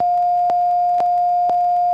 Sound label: Launch